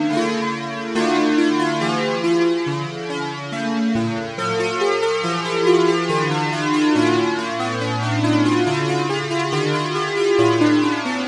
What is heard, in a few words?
Oscillator KEY; Alphatron; Scintillating Boost; Syntheway